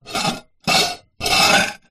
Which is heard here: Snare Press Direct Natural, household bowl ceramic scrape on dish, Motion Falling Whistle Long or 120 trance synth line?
household bowl ceramic scrape on dish